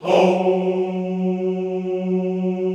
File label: HOUUU, Classical Choir